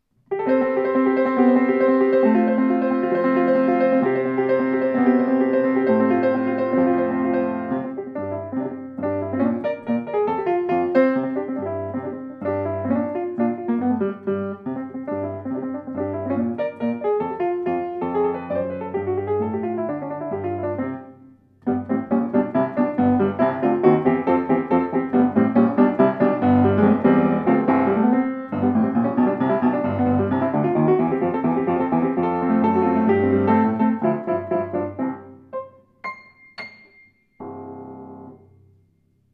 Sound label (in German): Grotrian Rag, Klavier